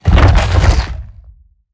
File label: Roll Surface Start; Evolve; Behemoth